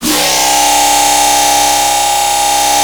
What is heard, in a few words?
Deserteagle, Noise; Cymatics; Shapeshift